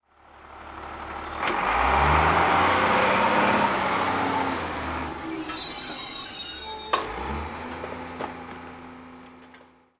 garbage truck; Counter Strike Model Pack; CS:GO

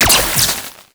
orb bolt

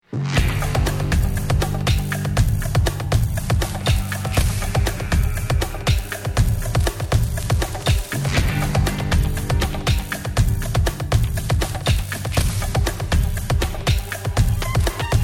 bomb planted; music

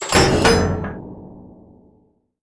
blast door latch; ambient; Half Life 2; Episode 2; outland

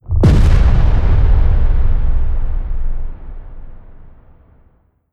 strider fall; destruction; Half Life Alyx

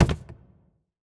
phys cube lg; Portal 2

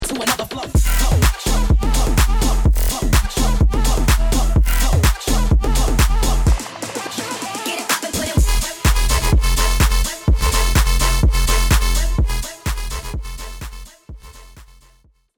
start action, music

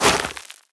scuff, footsteps player debris

